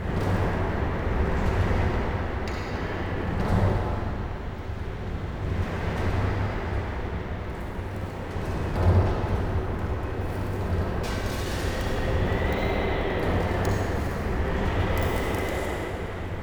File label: ambience large hall